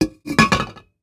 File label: Pan Put in Drawer